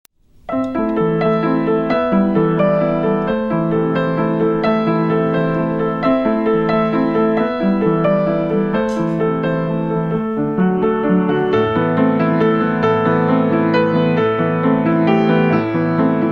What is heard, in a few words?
piano